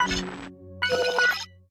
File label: bet Level 2; hotline2